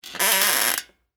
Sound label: Squeak Metal Springs, Bicycle, Seat; Texture; BlastWave